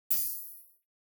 Button Short Tone - Air; Hollywood Edge; sound designer's toolkit v2

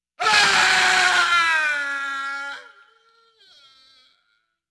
Man Screams Intense; Halloween Havoc - Sound Effects; Ktel

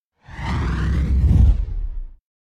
Fire Ball Passing Flying Fire Ball; Hollywood Edge; sound designer's toolkit v2

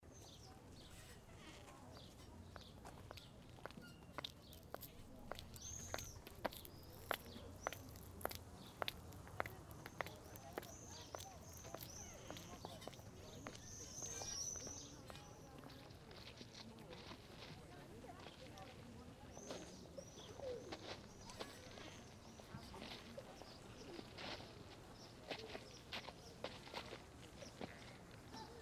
Spring; Blind Quest - The Frost Demon

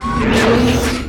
Gun Draw Select Scifi, Weapons Foley; SFX Kit

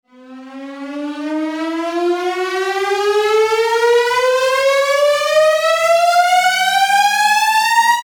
Rip The Bandade Quick, Tention Makers; RoosterFX

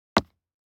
DICE on Neoprene, Drop, Standard, Two Dice; Samples; ArticulatedSounds; DICE Sfx Party (Extended)

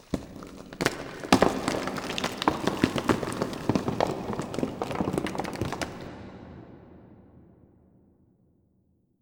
Rocks; Inquisitor 3

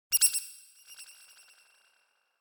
Bird Very High Warble - Lower; Hollywood Edge; sound designer's toolkit v2

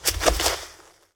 Bodyfall Grass; SFX Kit; Bodyfalls

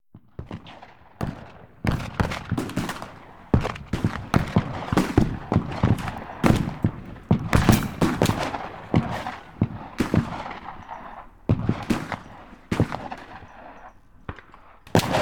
War Ambience Battle; Sound Ideas; Guns

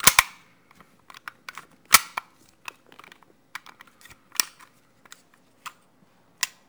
Gun Handling Weapon, Weapons Foley; SFX Kit

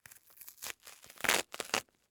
Face Mask, Medical; Blastwave

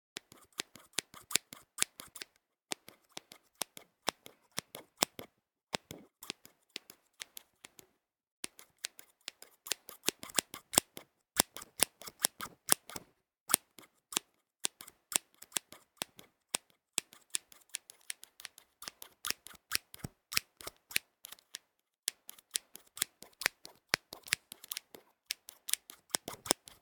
scissors; NS Studios - ultra sound collection

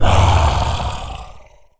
zombie attack; DeadFright

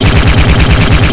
Assault Rifle Shot Loop; SFX Kit; Weapons

